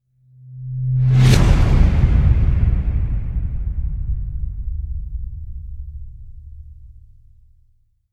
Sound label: slams with whoosh; goliath